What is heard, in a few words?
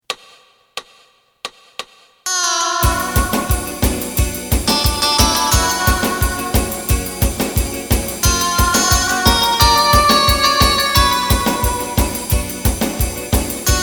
bass